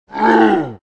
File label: grunt; hotline miami